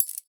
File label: inventory item select; Counter Strike Model Pack; CS:GO; panorama